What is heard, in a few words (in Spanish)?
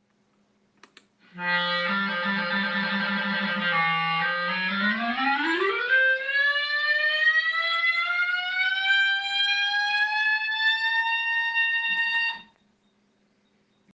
clarinete